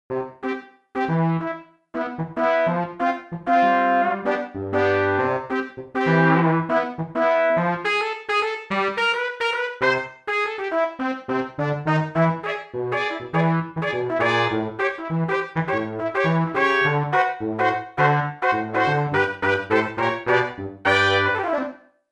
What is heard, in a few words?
Brass, Roland JV-880; Factory Patches